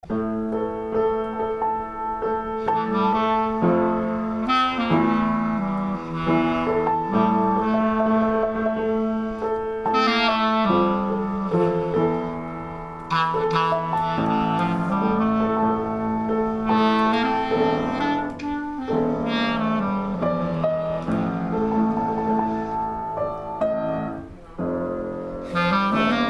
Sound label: acoustic, partial